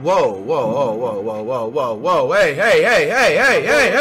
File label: woah woah woah hey hey hey